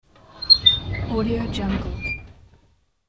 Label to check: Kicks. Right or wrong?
Wrong